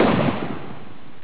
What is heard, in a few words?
gun shot; Crash Bang Boom